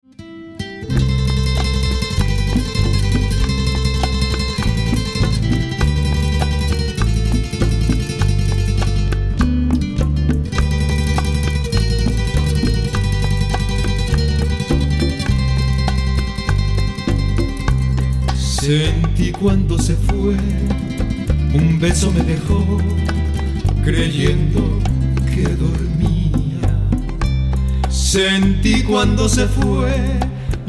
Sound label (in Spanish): suspenso infernal